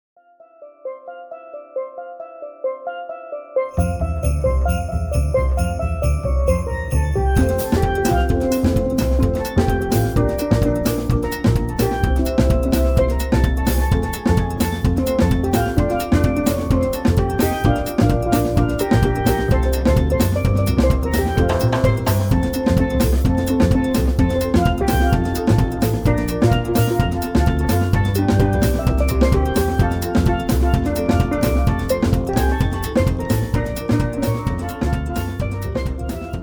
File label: steel